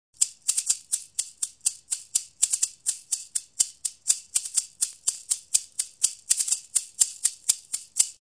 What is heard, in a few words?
maracas